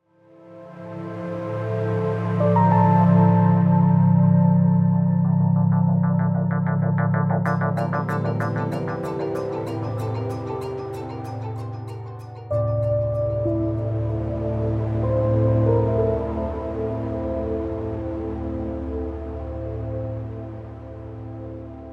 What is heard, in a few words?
map start background; Valorant; cstrike